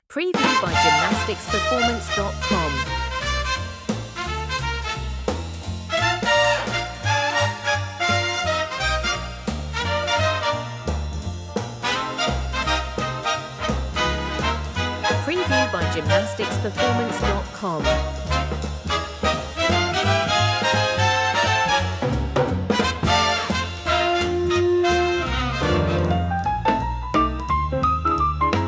big band

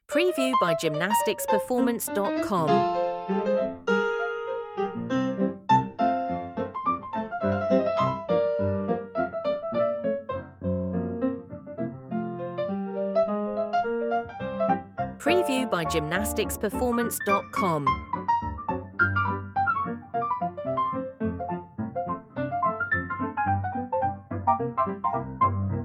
ragtime

